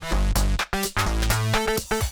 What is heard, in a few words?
break; LaRochelle